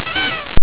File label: toot